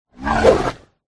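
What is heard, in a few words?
werewolf attack voice bottom; oboroten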